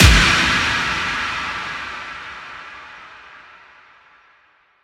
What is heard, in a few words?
explode; santa vs rudolf